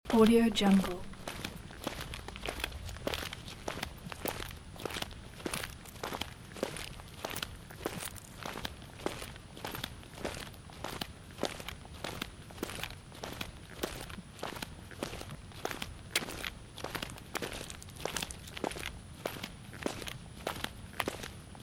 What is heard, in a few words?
walking in sandals on pavement